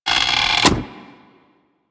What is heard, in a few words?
Rolling Drone Turret Turn